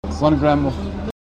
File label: loop